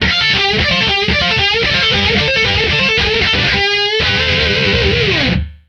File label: Guitar Shred